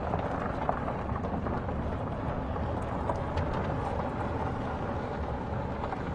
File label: car roll loop